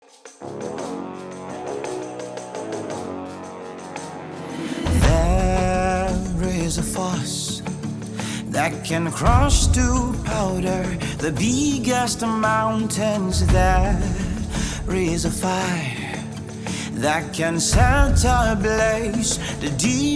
Ambience; Pastor Emmanuel Iren

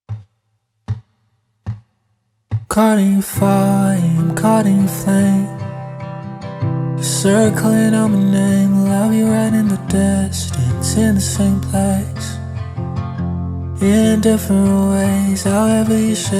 Acoustic Version

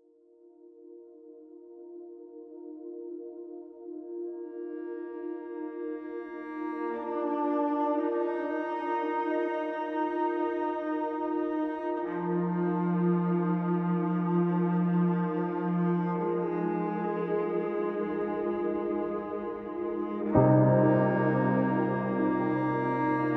Slowed Down Pitched Up

